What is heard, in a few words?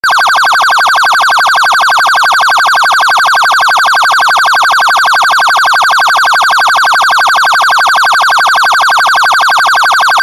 Siren Tone UNITROL FUTURA